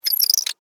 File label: Alien Insect Vocal, Scifi, Alien, Insect, Vocalization, Creature, Small, Science, Fiction; BlastWave